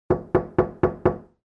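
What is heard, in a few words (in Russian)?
пять острых тревожных стука; Звук стука в дверь для сказки; Для детей, для детских постановок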